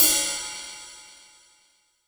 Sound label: big room drum crash b key; cymbal drum samples